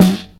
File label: Tight Low End Snare Single Hit F# Key